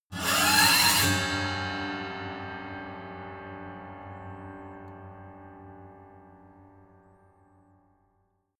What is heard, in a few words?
piano bow string broken high